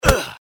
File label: hurt; Keep Out! A Dungeon Adventure for the Brave